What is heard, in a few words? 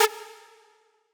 synth